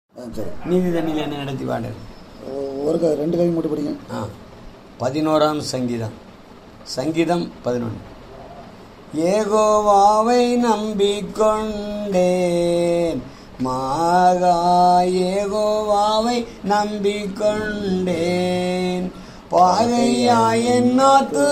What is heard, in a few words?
Vocal; Psalm11